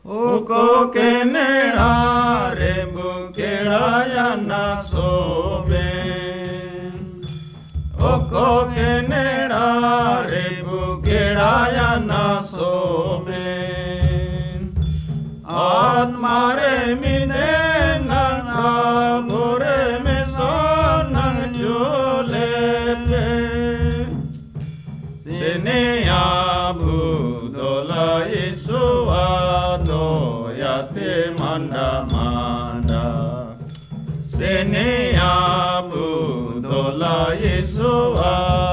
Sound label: song, mundari; words of life